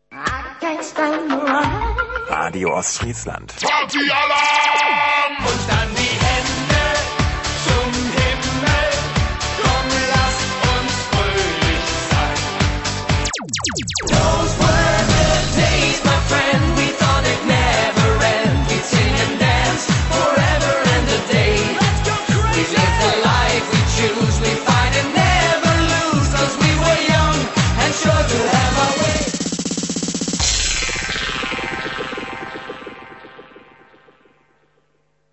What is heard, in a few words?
Partynight Jingle